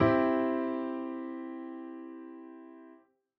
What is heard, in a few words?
root